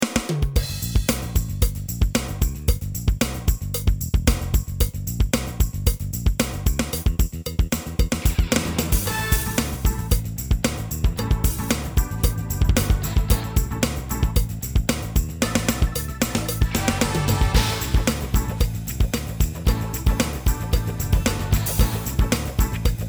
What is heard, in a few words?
Roland TD-6